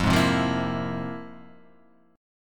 F-Minor Major 11th, down; FmM11 strummed; JGuitar

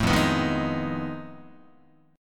F 7th Sharp 9th Flat 5th F Guitar; strummed; chord; F7#9b5; 0,1,2,1,0,2; JGuitar

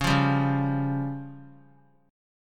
C sharp Major Flat 5th; C#Mb5 strummed; JGuitar

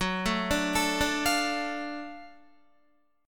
Gb Minor Major 7th Sharp 5th Gb down Guitar; arpeggiated; JGuitar

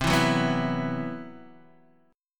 C Major 7th Flat 5th C, Guitar Open D

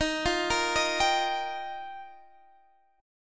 F 7th Suspended 2nd Suspended 4th Eb

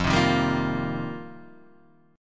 F 9th Suspended 4th F Guitar Open D, chord

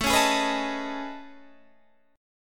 A Major Sharp 11th, Guitar, Standard; AM#11 strummed; capo=3

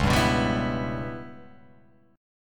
Db Minor 7th Flat 5th Db down, chord; strummed; JGuitar

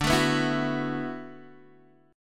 D 6th Add 9th, Guitar DADGAD

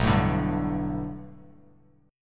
B Minor 7th Sharp 5th B Bass Standard, chord; 7,12,12,0; Bm7#5 strummed; JGuitar

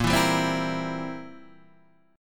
C 7th Bb

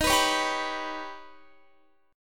Eb Augmented 7th Eb, Guitar; strummed; 4E,4A,5D,5G,5C,6F

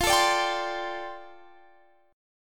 G 7th Suspended 2nd Suspended 4th F chord

